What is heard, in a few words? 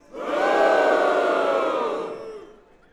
Crowd Boo Strong; Sony Pictures Sound Effects Series; Vocals & Wallas